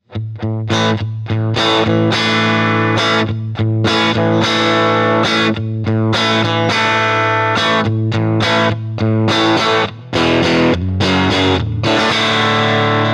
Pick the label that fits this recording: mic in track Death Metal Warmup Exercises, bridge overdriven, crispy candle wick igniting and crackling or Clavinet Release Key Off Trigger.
bridge overdriven